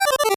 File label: 8bit se